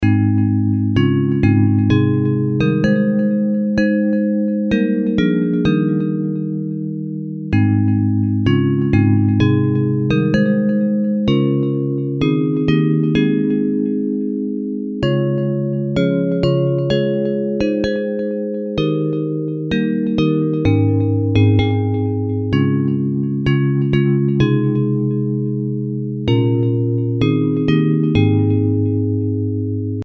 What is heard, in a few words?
Bells